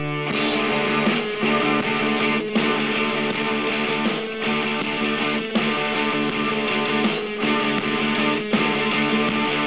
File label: Mew music